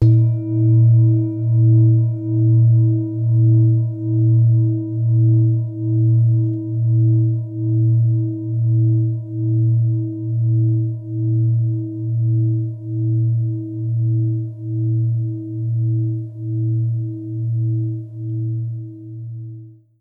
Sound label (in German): Klangschale; Mondknotenumlauf